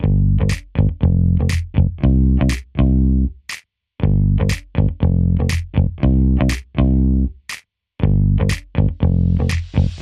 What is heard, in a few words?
Funky